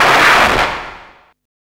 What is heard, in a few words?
War FX, Hard & Loud Techno; USB Soundscan vol.01; AKAI